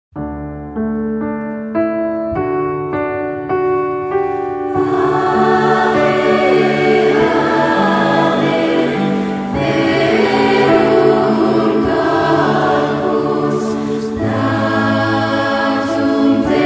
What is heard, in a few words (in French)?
Ave Verum Corpus acc. au piano; W A Mozart